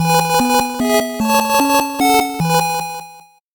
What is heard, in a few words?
8bit jingle slow